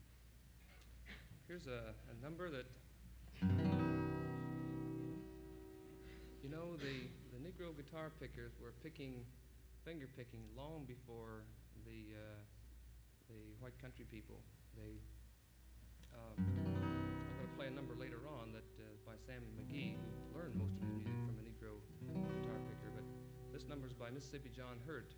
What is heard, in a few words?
Applause